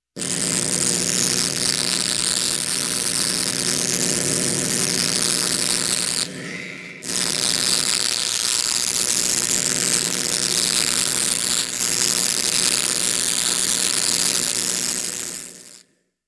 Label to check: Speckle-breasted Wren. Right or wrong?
Wrong